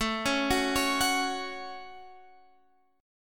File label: A 6th, Guitar, Open F; A6 arpeggiated; x,16,13,13,16,13